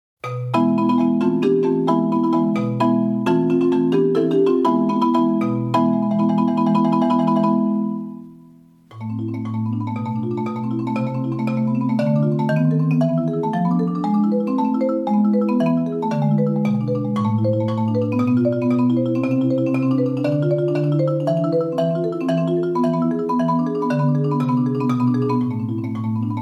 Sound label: Bullfight solo